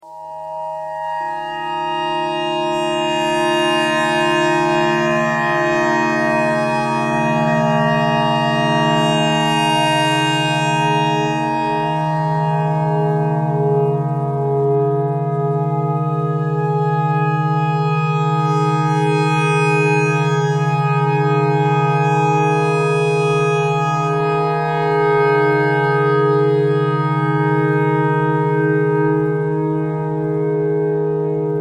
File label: clarinet clarinet notes e thread pentatonic